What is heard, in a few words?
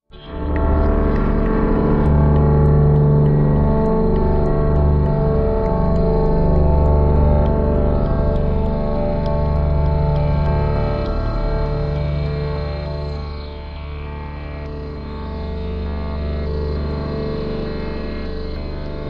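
Low Pulse, musical; Determination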